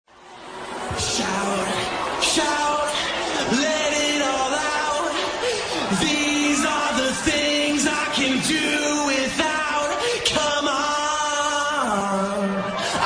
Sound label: iphone 6s remix ringtone